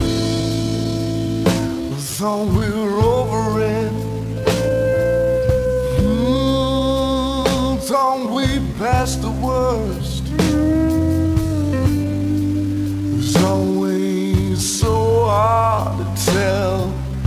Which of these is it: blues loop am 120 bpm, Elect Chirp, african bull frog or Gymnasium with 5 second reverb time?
blues loop am 120 bpm